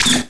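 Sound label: LASER BEAM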